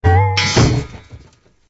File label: canned impact only